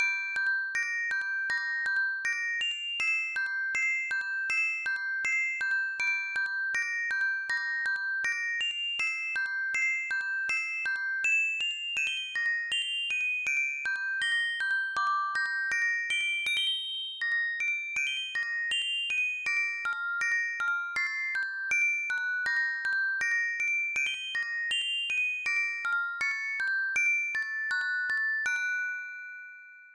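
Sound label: orgel, music box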